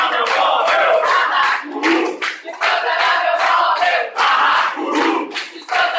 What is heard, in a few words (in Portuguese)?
grito guerra happy friday